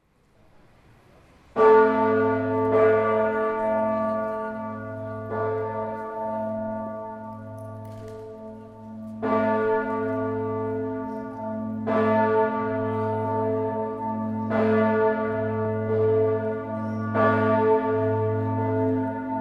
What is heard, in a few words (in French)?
Sursee cloche 1